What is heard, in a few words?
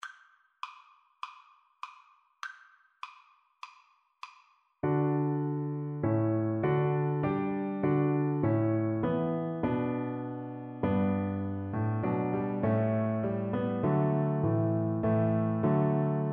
Es ist ein Ros, Cello